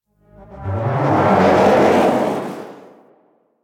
Teralyst Whoosh Step; Enemies; Steps

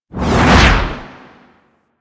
Arch Wing Dodge Roll Right Low, Vehicles; Movement; Warframe

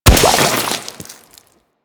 Chem Strike Nox Rifle Projectile Explode

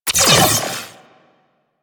Riot Moa Tether Fire Zap; Warframe; Attacks

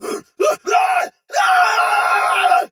Pain Heavy Soldier Sword Agent Male; Dialog; Barks; Battle; Melee; Sinecure

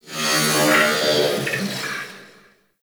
Corpus Ship Metallic Robo Chatter; Ambience; Warframe; Corpus Ship Remaster

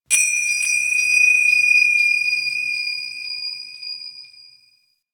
Temple High Bells; Ambience; Warframe; Corpus Ship Remaster

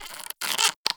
Ext Insect, Ambience

